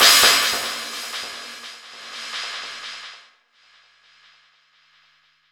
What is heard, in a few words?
crash L; Zero-G; Chemical Beats; CRASH ZOO